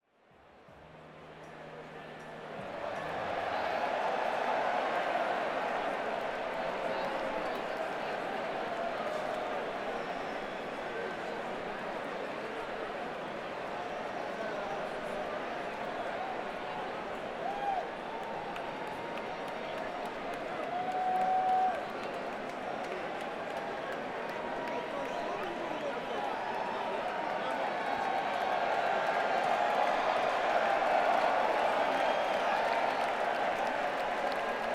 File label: Crowd, Madison Square Garden; New York, NY